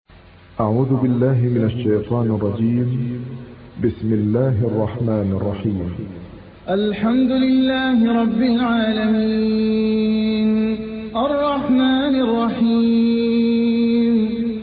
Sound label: Fatiha retsitatsioon